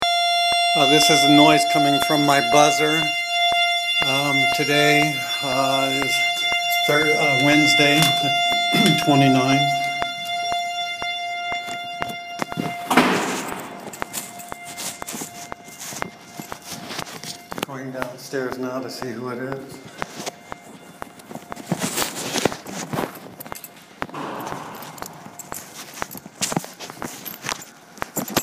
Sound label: buzzer stuck